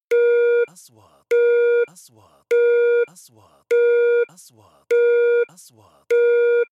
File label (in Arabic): نغمة تنبيه طبي; transitions